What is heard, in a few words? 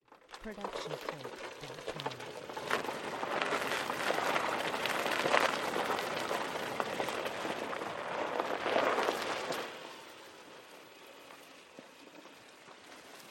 golf cart driving dirt close up on wheels; mono; soundscrate